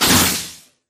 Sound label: imp bullet lightballistic shieldwall sizzle; Titanfall 2